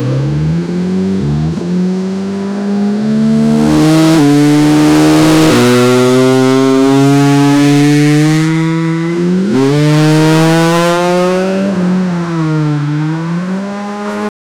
rally car v12 engine sound; motorsport

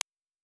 ui click

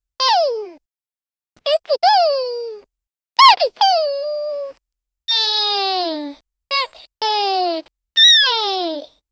stylized cartoon little, vocalizations

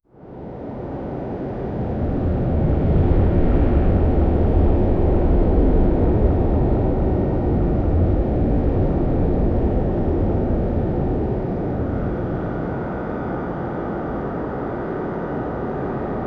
futuristic dark laborator, laboratory

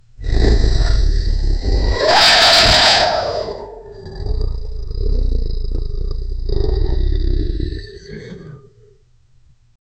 the dragon opened its mou

